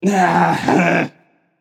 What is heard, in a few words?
Boohoo; smite; raijin